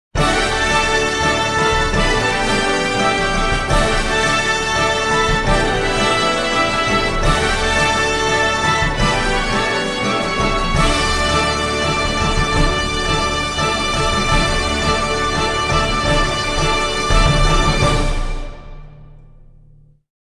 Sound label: WIN MAX; Sizzling Hot DX